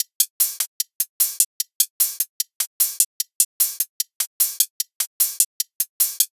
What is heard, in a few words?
Hi-Hat Loop 150; Trap and Dubstep